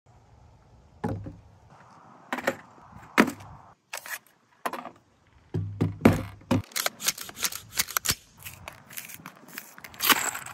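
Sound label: M4 carbine, gun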